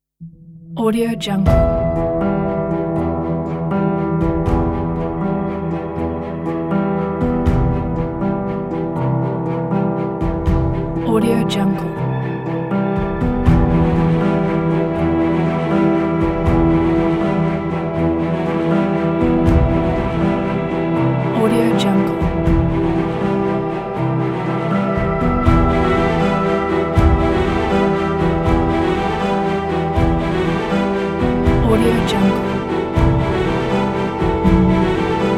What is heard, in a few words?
series orchestral opener